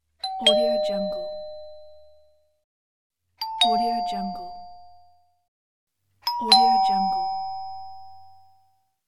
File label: door bell; pashastriker